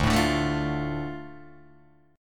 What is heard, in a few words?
D Minor D Guitar DADGAD